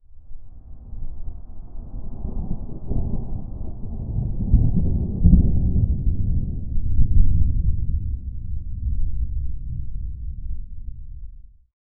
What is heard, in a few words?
thunder rumbling in the distance